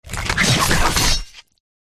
Twoheaded Dog

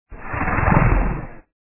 spider

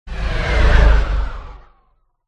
zombie buff in

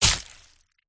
worm attack contact up

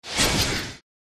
Air Spirit Wound

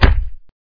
wound punch

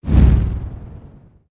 attack contact

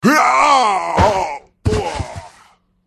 human male death

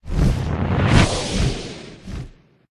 dragonkin

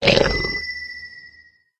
stun

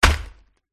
goat attack contact middle

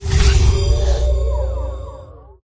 bug

